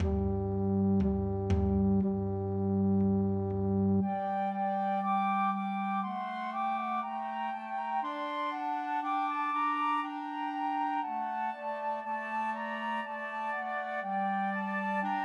Pat a Pan, Christmas Trios for Two Flutes and Clarinet